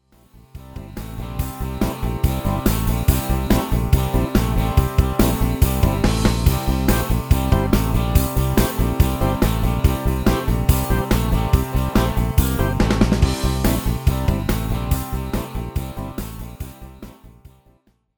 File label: ROCK